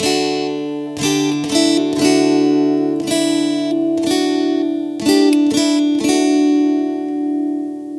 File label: Guitar - 120 BPM (D Major), Acoustic Guitar; Motel 156; GhostHack; Free Guitar And Piano Samples